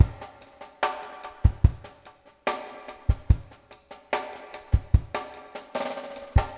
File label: DRM WET; Spectrasonics; Liquid Grooves 1 & 2